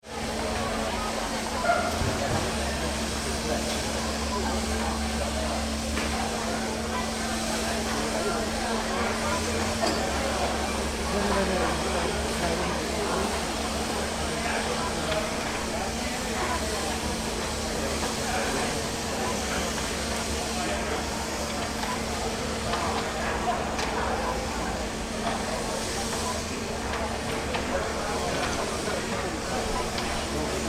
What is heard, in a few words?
Sheep Shearing Competition